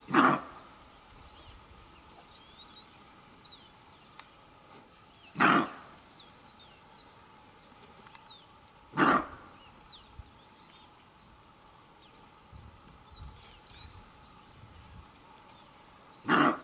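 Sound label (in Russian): lay v gustyh zaroslyah akatsii, antilopa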